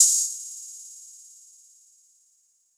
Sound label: Open Hat